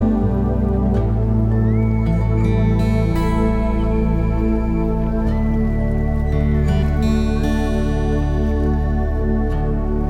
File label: Instrumental; ramtitam